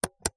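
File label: computer mouse click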